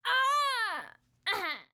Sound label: female death, human